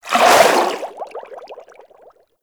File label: Melee Whoosh; Warframe; Vehicles; Sharkwing